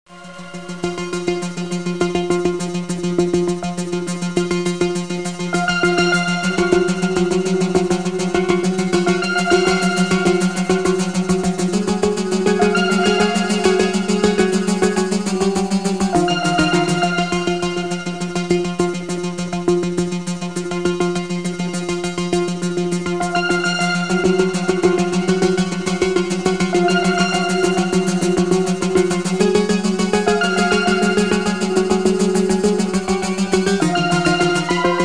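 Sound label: SYNTHESZ, synthesizer; Gold Medal Software - Volume 4; os2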